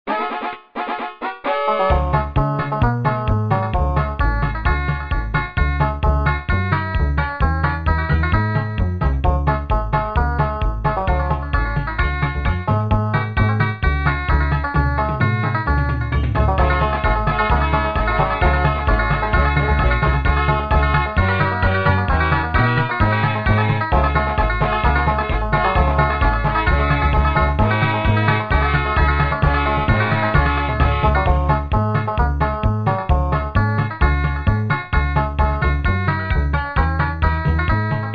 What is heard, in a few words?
country music